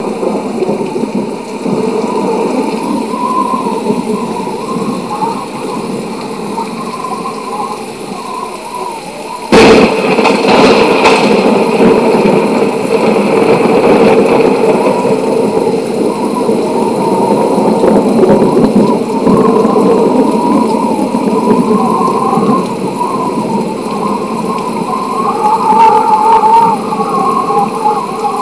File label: rain